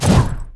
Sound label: basic attack; Yorick